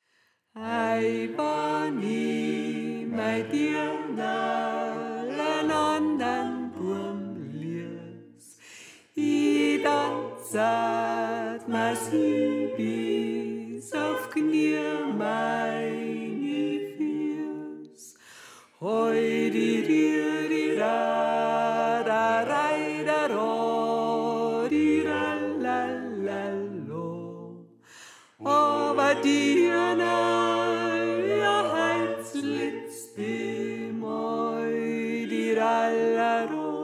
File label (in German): Tenor; Ei Wann I Mei Deanderl